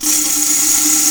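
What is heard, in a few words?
REQ-ROLL, pitched percussion; East West - Global Sounds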